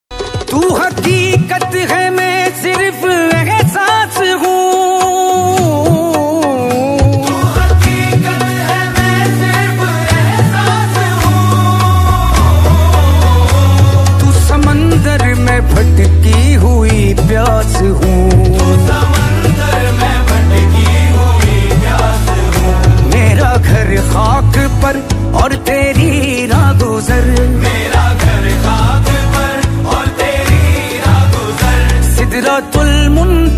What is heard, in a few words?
Naat Sharif Ringtone